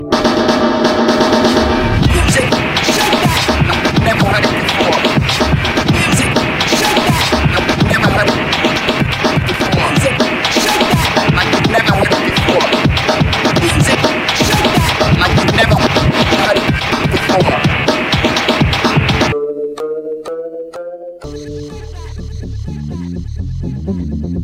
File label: Koto Stomp